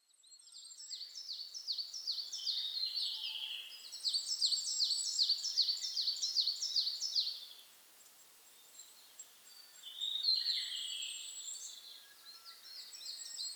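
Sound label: goldcrest; kikuitadaki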